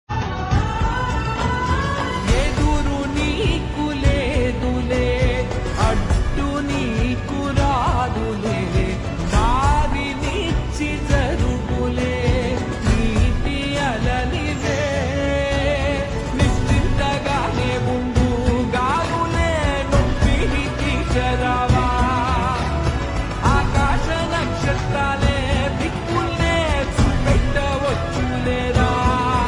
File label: Slow Reverb Version, Ringtone